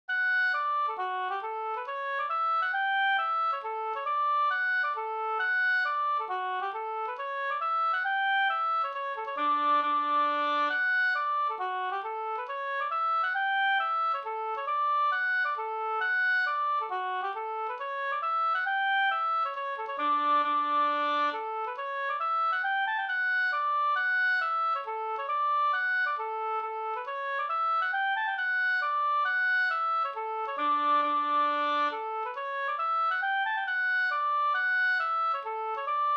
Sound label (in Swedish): hambopolska